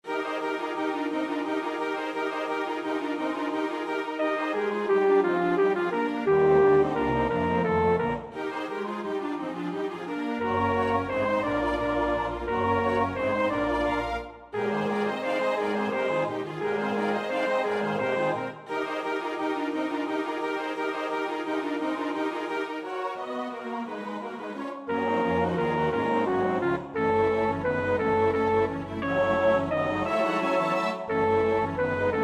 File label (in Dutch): bariton 1; Poulenc (Francis); Stabat Mater